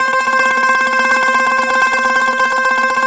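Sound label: TZU TREM C 4, tzouras tremolo; Soundscan vol.53; Mediteranean Traditions; AKAI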